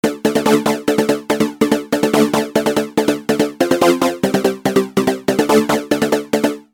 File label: Lead Technica; microq